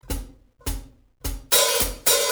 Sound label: sabian 14 aax stage hats